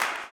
CLAP, Blues Soul; Spectrasonics Vocal Planet

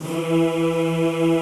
E2 L, Classical Choir; Best Service; Extended Classical Choir

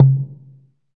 PER, Percussion

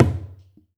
ETHNIC DRUMS; Spectrasonics; Liquid Grooves 1 & 2

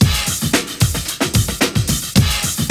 BEAT, Beats 106-111bpm, Hip Hop; E-MU Formula 4000 Series Vol. 1; Hip Hop Nation

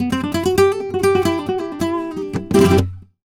Flamenco Guitar Frase; Best Service ProSamples vol.46 - Flamenco

